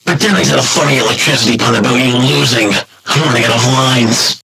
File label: game over